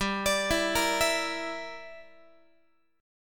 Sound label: G Minor 6th, Guitar; arpeggiated; 4A,4E,5A,5D,5G#,6C#; Gm6